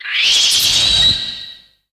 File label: Kamacuras; GFW